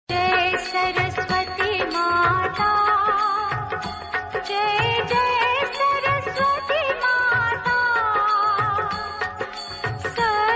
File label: Aarti Ringtone; Jai Saraswati Mata